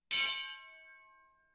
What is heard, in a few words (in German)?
Sport Rundenglocke 1 Schlag